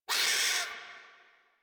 Forest Rodent Vocal Pain; Warframe

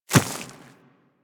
Infested Small Walk Dirt, Footsteps; Warframe

